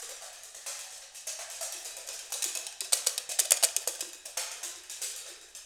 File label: shell, shell dance, perc fx; Roland LCDP14 Africa VOL-2